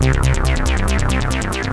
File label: bass riff; lmms; bassloopes